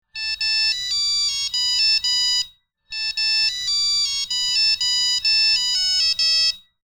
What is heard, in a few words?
disko beep egg; vejce na tvrdo